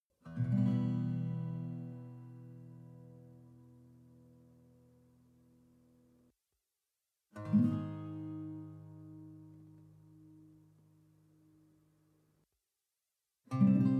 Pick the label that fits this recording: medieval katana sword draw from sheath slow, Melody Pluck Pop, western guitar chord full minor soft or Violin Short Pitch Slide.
western guitar chord full minor soft